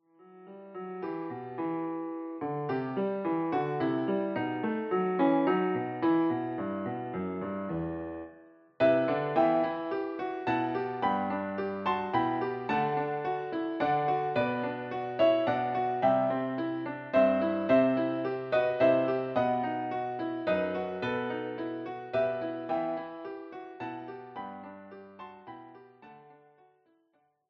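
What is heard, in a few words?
Greensleeves, Klavier, 4 händig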